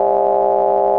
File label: rumble tone, Organs